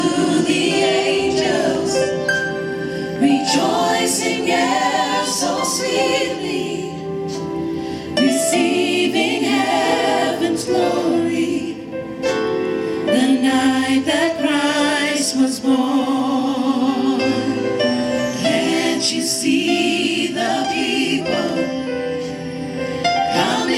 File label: Church choir